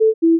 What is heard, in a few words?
warning